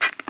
radio click

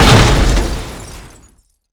car impact

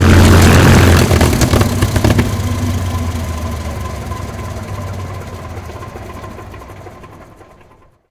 engine stop; War Thunder

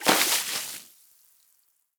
waterbucket splash; Rust

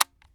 mp7 hammer; Escape from Tarkov

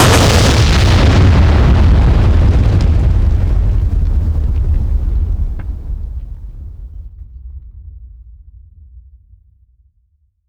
Explosions Explode Ground; DCS

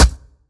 Aircrafts Engines Packard Merlin Prop Ex Sm Pop; DCS

